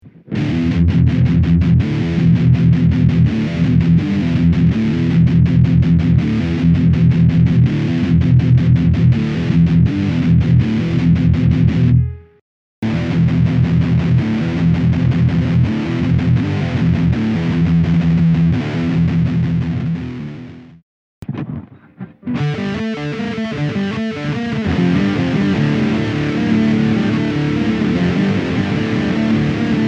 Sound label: Washburn + GT-8